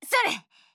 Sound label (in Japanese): 攻撃